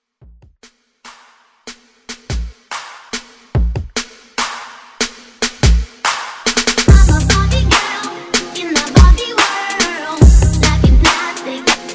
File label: redrum